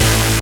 SYN Noise Kill; Best Service Gigapack 1

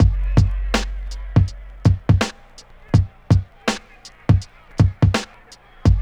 BEAT, Hip Hop

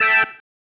Stab, Organ, One Shots

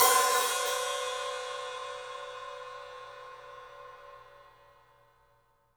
Studio Hats, 3DPercussives

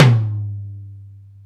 tom, Roland; Club-50 - Foundations Roland; Ext.Snare 2

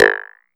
RIM PROCE, RIMS, Fresh Disco House; USB Soundscan vol.20; AKAI

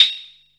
NOISE RES, synth perc; Zero-G; Ambient 1

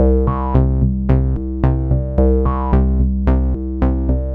MODU C, Modular Synth Loops; Polyend; Simulacrum Sounds

